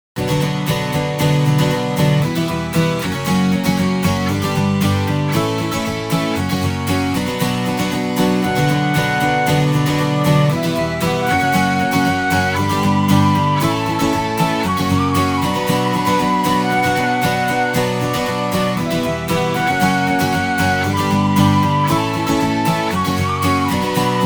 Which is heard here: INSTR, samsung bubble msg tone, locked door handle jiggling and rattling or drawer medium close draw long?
INSTR